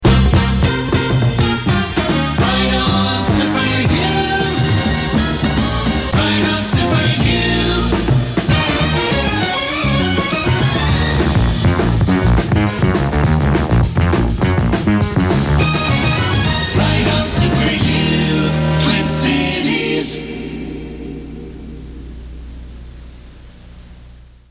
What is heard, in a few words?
Jingle; WYOO; Top of Hour